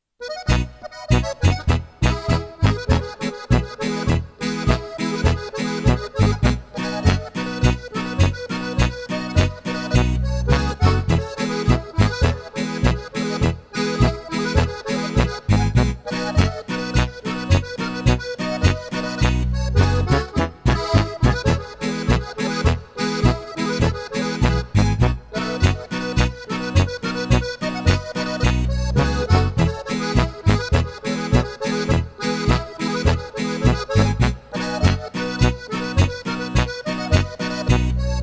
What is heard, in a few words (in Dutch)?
Limex G5 Mex Bas Gitaar